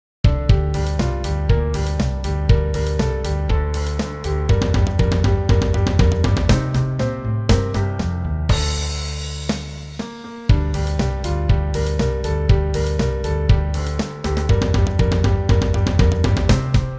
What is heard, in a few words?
Metal Rock